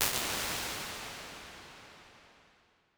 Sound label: Auto Park, Lexicon 480L Reverb, impulse responses; Big Gee's